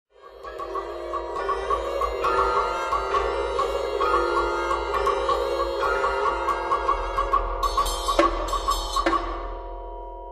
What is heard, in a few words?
Dance of the Seven Veils, for chamber ensemble; Drummond